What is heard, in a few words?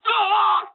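aircraft destroyed scream, speech japanese; War Thunder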